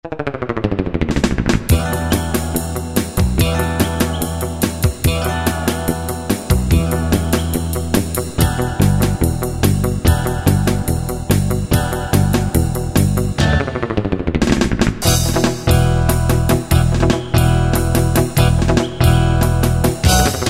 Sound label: Surf Rock, Yamaha QY70; Preset Styles